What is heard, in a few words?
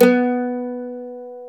MANDOLIN